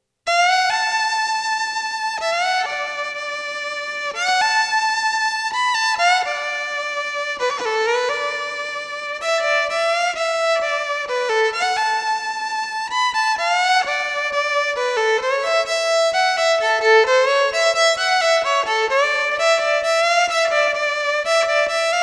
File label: Blues Melody Solo